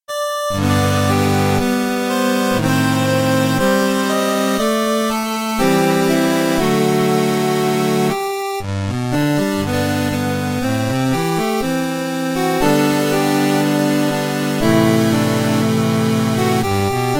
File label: 8 bit remix